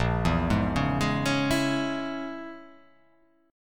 Bb Minor 7th Flat 5th Bb, Bbm7b5 chord; Bbm7b5 arpeggiated

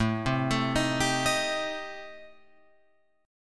A-Minor 7th Flat 5th, Guitar Open F, chord; arpeggiated; JGuitar

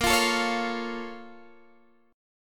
A sharp Minor Major 7th; A#mM7 strummed; x,13,15,14,14,13

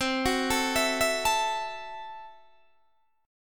C 6th Flat 5th, C, down, Guitar, DADGAD

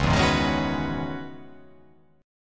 C sharp Minor 11th C sharp

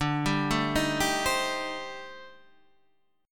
A-Minor 7th Flat 5th D, chord; Am7b5/D arpeggiated; JGuitar

